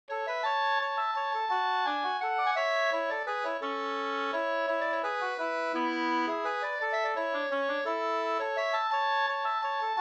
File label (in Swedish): vals; Glaboln; Bjorkvik